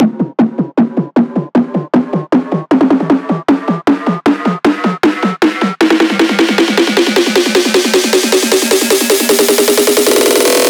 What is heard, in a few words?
buildup loop 155; GhostHack